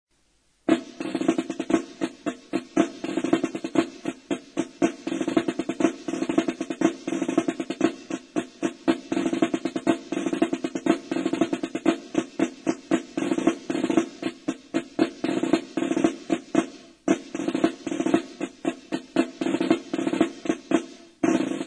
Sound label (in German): Parademarsch